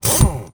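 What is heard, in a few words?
Impact small, air tools, industrial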